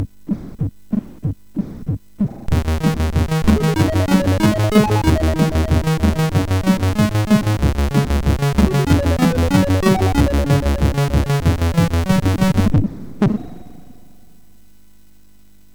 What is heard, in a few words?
Slideshow Intro, sid MOS6581R4; hvsc